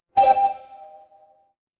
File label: door doorbell